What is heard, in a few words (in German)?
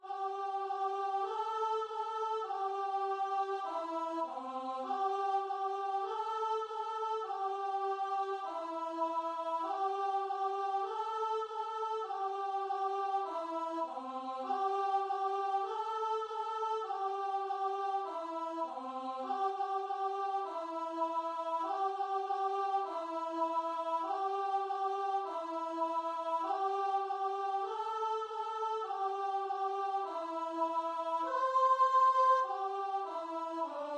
backe backe kuchen VOICE